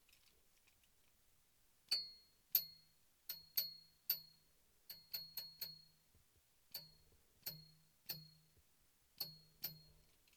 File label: Tapping Metal